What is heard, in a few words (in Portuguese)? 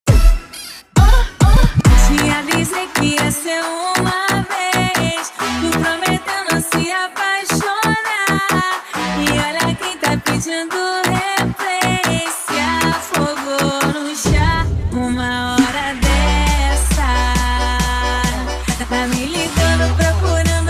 funk mega funk